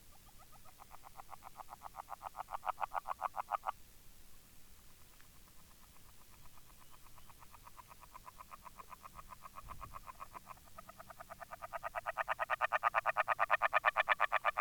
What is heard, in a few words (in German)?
Springfrosch; Amphibien; Froschlurche; Stimmen; Oliver Swift